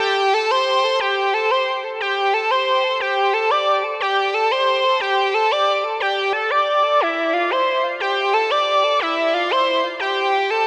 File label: Gm Synth Lead; Drums- Downtempo Loops & Samples; Sanctum